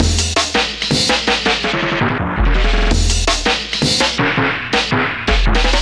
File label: Amen, Breaks, Jungle; Blu Mar Ten - Jungle Jungle - 1989 to 1999 Samplepack